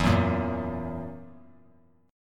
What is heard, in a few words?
E Suspended 2nd Flat 5th E down; strummed; JGuitar